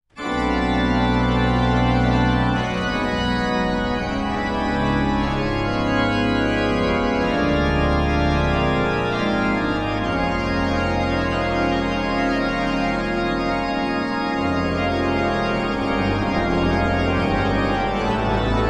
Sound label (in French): orgue